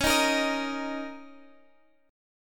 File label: Bb Minor Double Flat 5th, chord